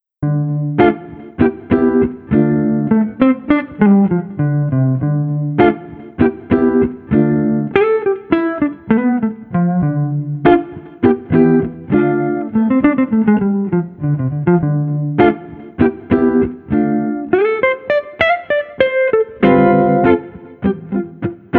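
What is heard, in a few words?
Street Guitar Chill Jazz, 100 bpm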